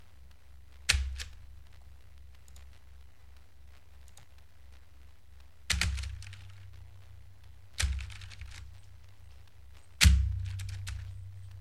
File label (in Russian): nazhatie na mehanicheskuyu klaviaturu